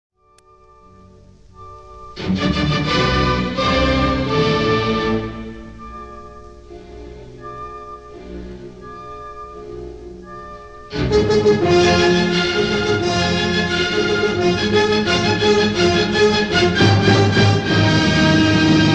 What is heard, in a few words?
Symphony in C minor Allegro con brio; Beethoven; Symphony No. 5; Op. 67